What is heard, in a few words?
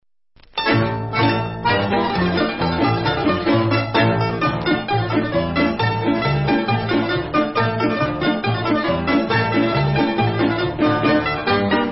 Lezginka, Instrumental